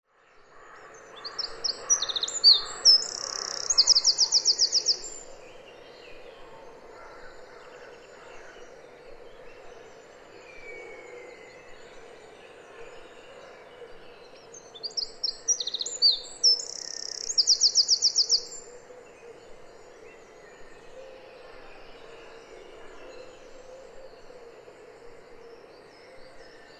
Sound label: wren